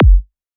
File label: Kick; LiLA'c Records PsyTrance Sounds 01